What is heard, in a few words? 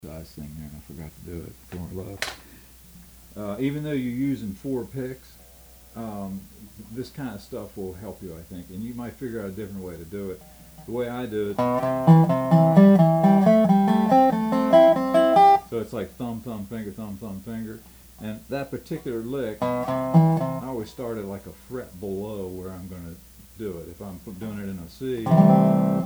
8 string